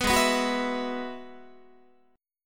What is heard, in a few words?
Ab Minor 7th Sharp 5th Ab, down, Guitar, 4A, 4E, 5A, 5D, 5G sharp, 6C sharp; strummed; JGuitar